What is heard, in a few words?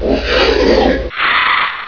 pain; doom